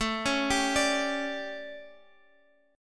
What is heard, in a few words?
A 11th Guitar Half Step Down